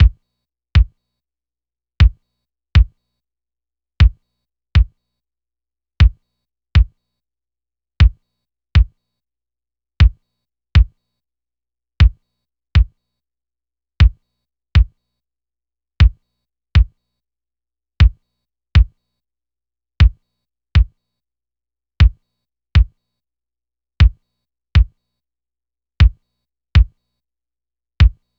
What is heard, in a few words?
drum tracks kick, Smooth RnB 060 Abmaj